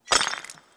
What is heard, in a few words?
Crystal break; Environment; BossRoom